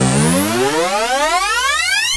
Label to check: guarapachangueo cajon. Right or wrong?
Wrong